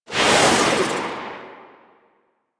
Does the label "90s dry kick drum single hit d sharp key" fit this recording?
No